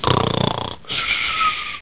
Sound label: SNORE